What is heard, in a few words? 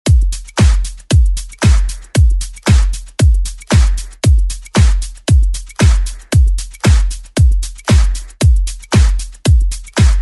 80s Club ReWork